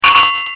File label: Notifier Bike Bell; Blackberry Bold